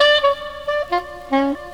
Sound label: sax, Loops; Phonky